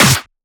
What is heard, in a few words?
SnareClap; FLPRO